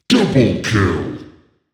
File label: doublekill